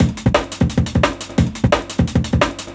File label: DNB BREAK